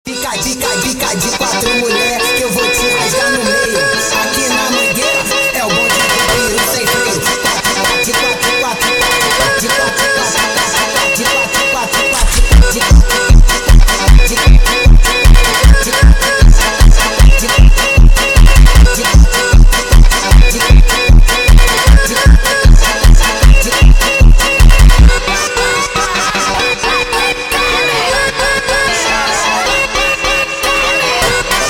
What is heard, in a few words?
Funk sped Up